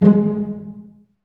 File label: STR SEC, ORCHESTRA; Roland - LA Composer vol. 1